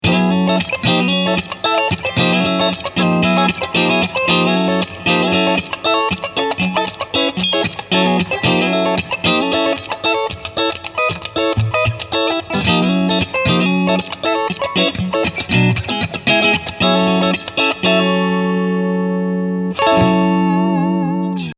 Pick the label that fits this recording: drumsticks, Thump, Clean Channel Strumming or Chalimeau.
Clean Channel Strumming